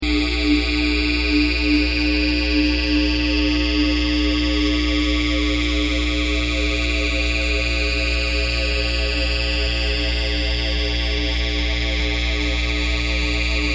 Electric Buzz Sci Fi, Flanged electrical buzzing and humming; weird, spacey